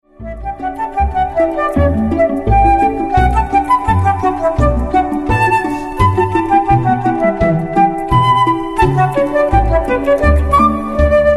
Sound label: Gavotte, Flöten